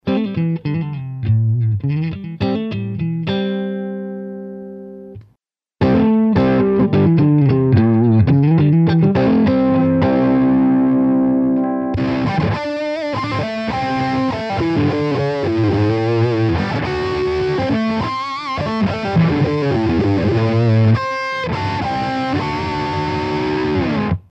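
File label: TS Tube Mixdown, guitar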